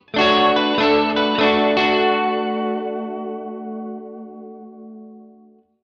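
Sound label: music stinger lootbox summer rare; Heroes of the Storm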